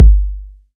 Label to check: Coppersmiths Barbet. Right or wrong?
Wrong